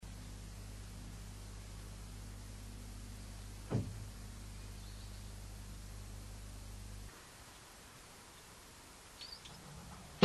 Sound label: window close